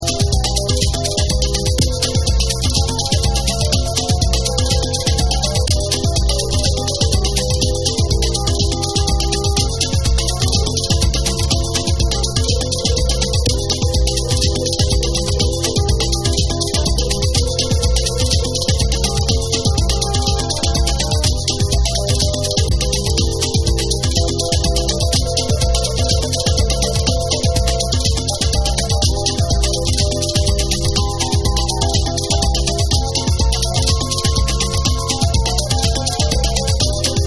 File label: BRAZILIAN GROOVE